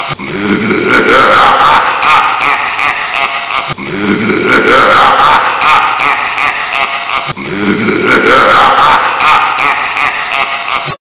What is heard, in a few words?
Wicked Laughing Man